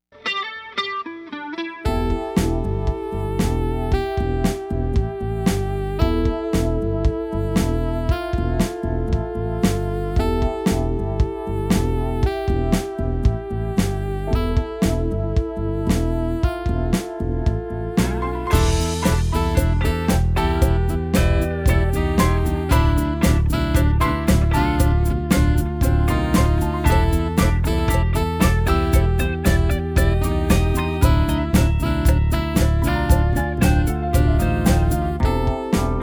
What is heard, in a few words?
Breakz